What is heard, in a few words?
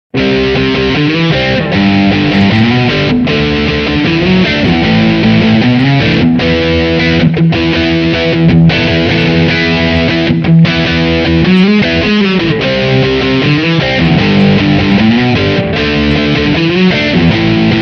LP Smartwood